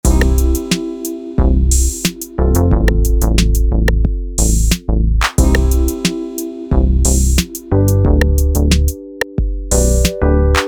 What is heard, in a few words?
Auto Pan sound BPM90